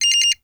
Cell Phone Ring

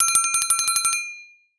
Bell, Metal

